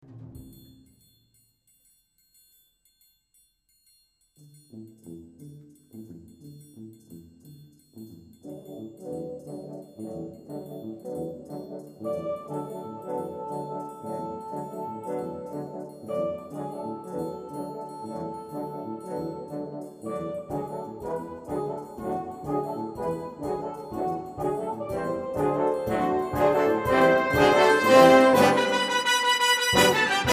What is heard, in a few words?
brass